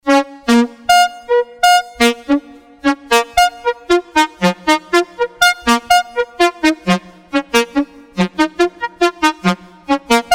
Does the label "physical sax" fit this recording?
Yes